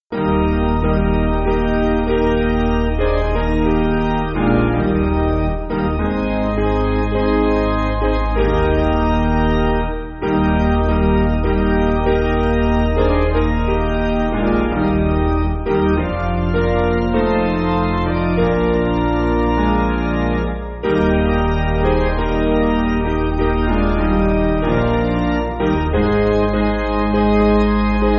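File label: Piano, Backing Files for Churches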